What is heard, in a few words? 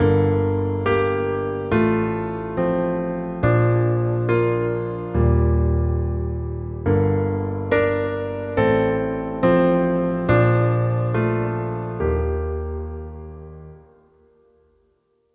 140 BPM Main Piano Gm, Leads; Ghosthack; Dusseldorf